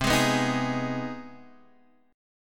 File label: C Minor Major 7th Sharp 5th, Guitar, Standard; strummed; x,3,6,4,4,4; JGuitar